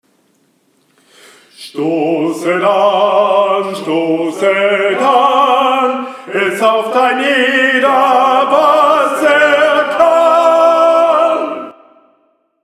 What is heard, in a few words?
TENOR; Gesang; Stosset an